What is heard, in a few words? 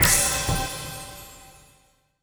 Game Finished; Cosmic Defenders